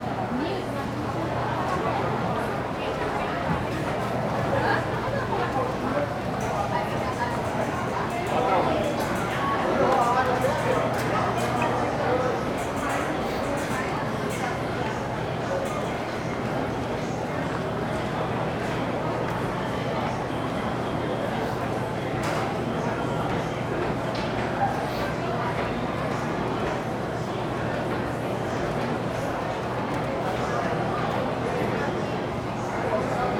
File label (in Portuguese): Vozerio dentro de grande loja de roupas com algumas batidas em balaustra d; multidao; de metal; Colecao Sonora do Cerrado